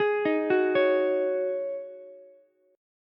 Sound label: C sharp Suspended 2nd Suspended 4th, C sharp, Ukulele; arpeggiated; JGuitar